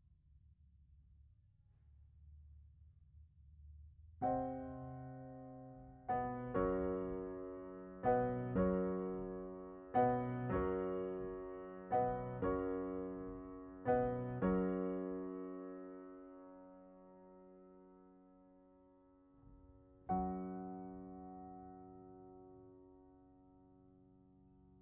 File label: Piano Mono